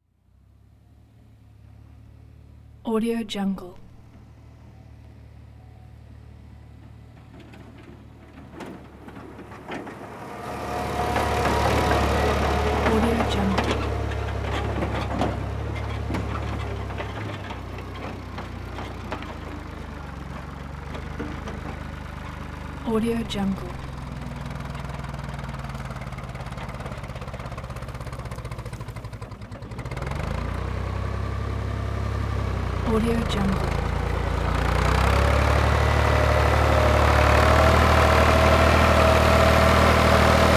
tractor ford approach

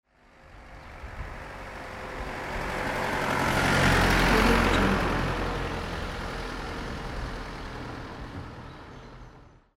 car slowly passing by

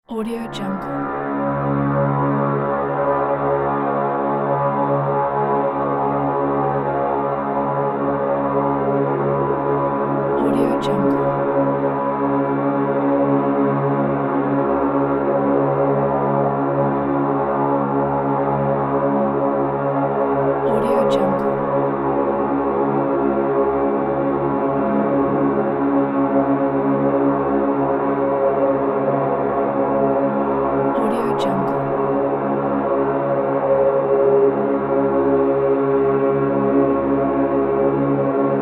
creepy low horns drone

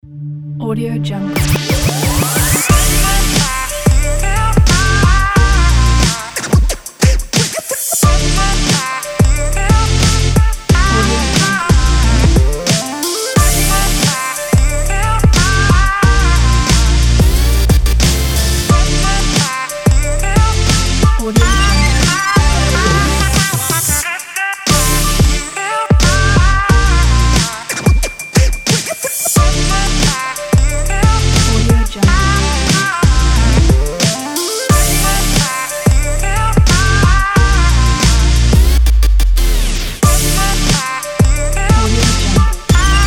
this hiphop is hiphop

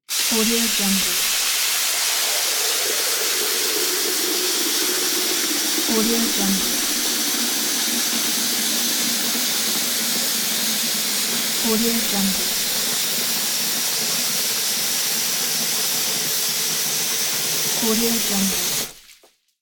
bathroom sink filling

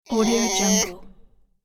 cartoon character effort

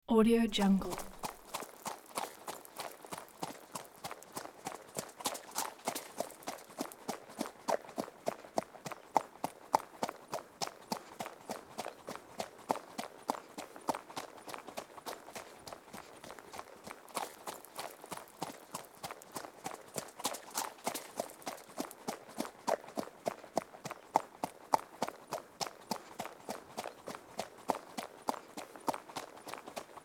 horse back riding; sound-ideas

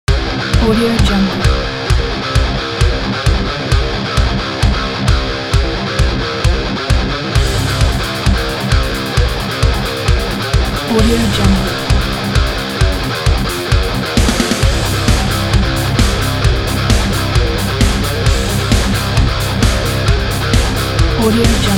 upbeat sport metal

